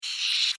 Insect Voice Scream, Insect, Voice, Scream, Vocalization, Bug; Animals:Insects; Blastwave